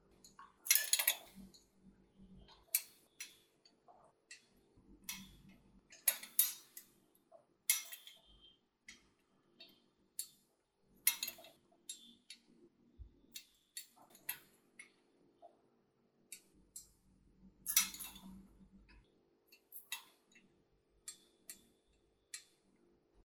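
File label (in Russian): metal chandelier with glass balls; Звук раскачивающейся люстры для монтажа, для создания атмосферы ужаса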